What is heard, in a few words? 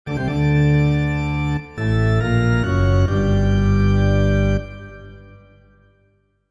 Germany Music; Civilization Revolution; xbox